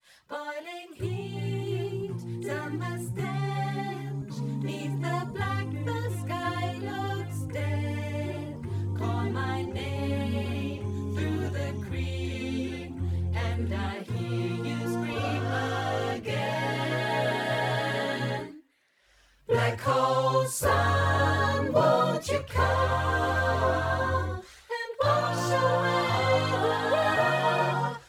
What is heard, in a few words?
Remote Choir Solo